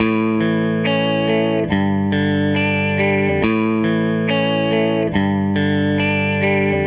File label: Les Guitar Loop